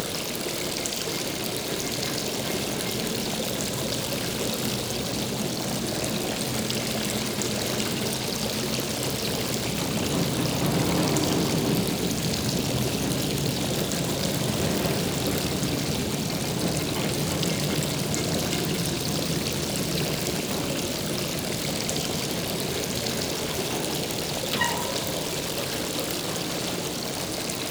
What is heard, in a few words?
ambience water